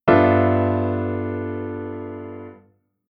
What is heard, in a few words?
Chord Tension Dominant V7 9 11 13